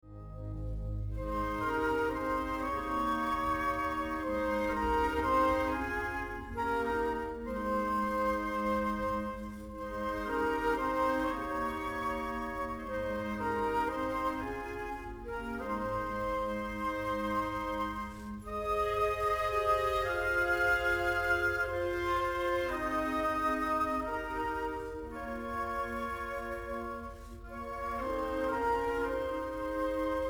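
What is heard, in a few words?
Hymn from Finlandia, organ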